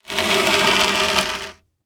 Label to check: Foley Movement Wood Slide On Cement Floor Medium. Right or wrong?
Right